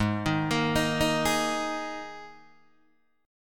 G sharp Suspended 2nd, G sharp, down, Guitar, Open D